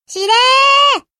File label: notif